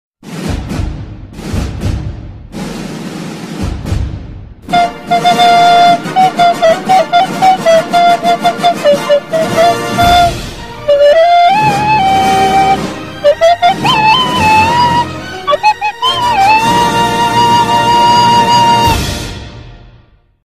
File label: Fox Flute